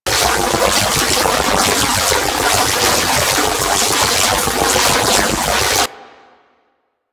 Beam Loop; Warframe; Captain Vor

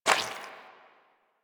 Footstep Default; Warframe; Pirate

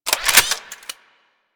Shock Rifle Reload, weapons; Warframe; Corpus

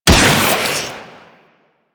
Infested Beam Pistol Shot C; Warframe; Weapons

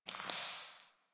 Derelict Interior Water Drip; Warframe; Orokin Derelict

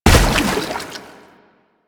Step Water, Footsteps; Warframe; Lasrian; Lasrian Soldiers; Foley; Lasrian Chem Strike Nox

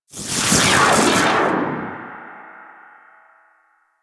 Slow Trap Avatar Enable; Warframe; Traps; Orokin

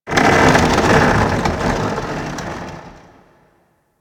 Ice Metal Creak; Ambience; Warframe; Corpus Ice Planet

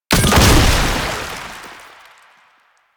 Lancer Death Explosion; Enemies; Soldiers; Warframe; Lasrian

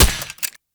Shot Initial Mech; Warframe; Weapons; Acceltra Prime

